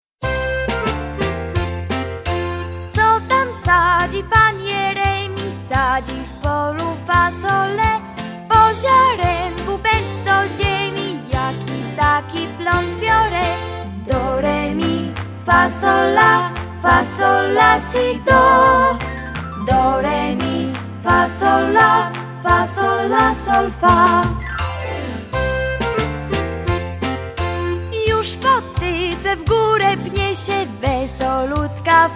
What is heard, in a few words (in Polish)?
Polka fasolka spiew